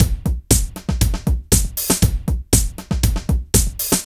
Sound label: drum loops